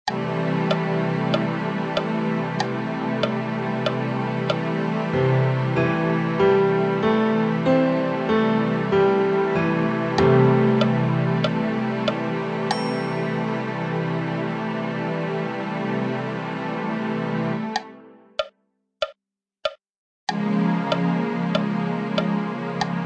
sexta

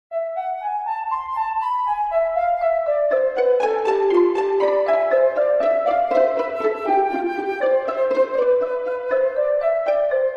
ejemplo canon dos voces

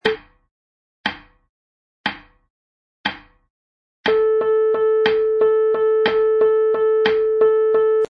ligadura dos tresillos

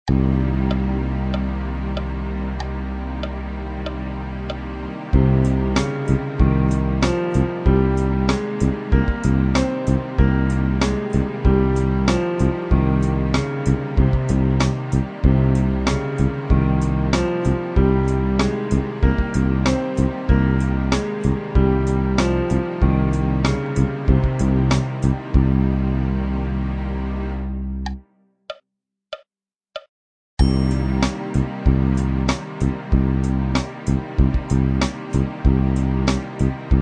frigio

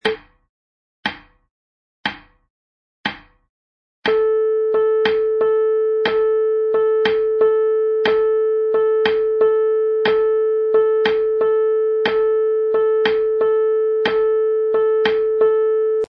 tresillo de negras

